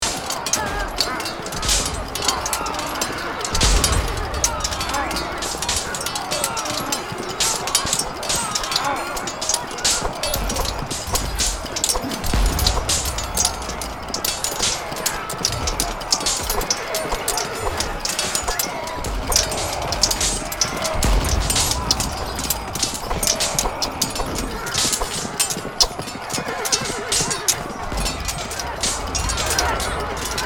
Medieval combat ambience knights swords and horses